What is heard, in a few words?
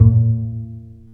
STR Cb Pizzicato; Roland L-CD702; Cb Pizz 1